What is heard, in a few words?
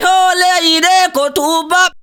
VOCAL LINES; Best Service; World Colours